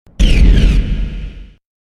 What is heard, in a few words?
laser shot